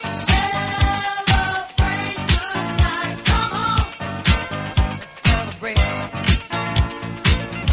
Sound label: song